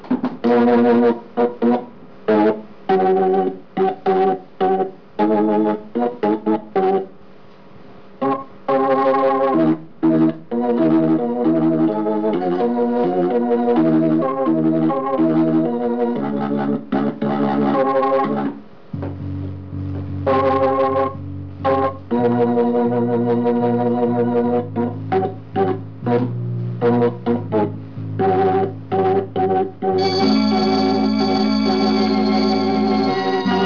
orgao

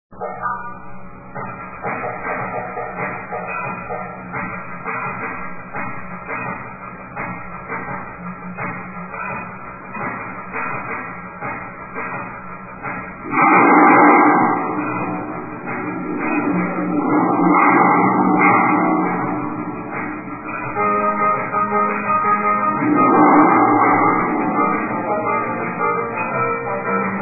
sons e ritmo

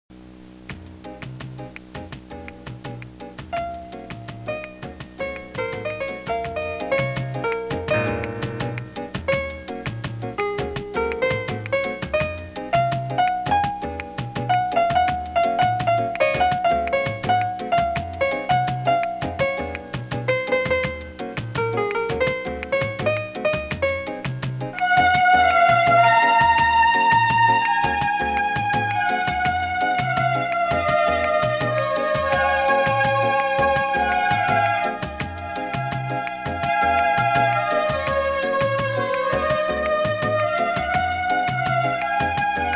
samba

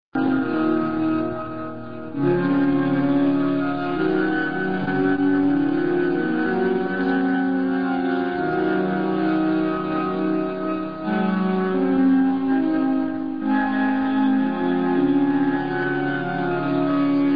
orgao

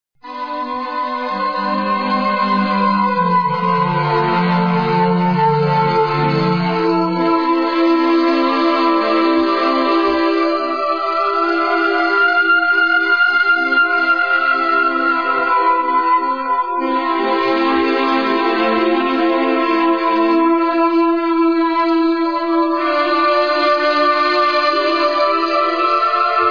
piano lindo

